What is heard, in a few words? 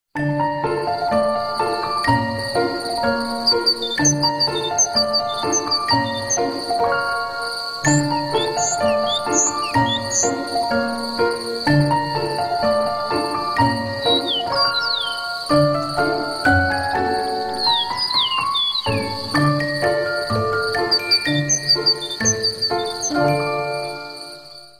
Nature Piano